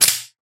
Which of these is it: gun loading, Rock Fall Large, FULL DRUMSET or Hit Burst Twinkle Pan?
gun loading